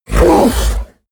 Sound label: fishman roar frightened; kreaturen